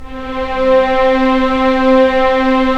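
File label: STR String Section; Roland - LA Composer vol. 4; Full Sec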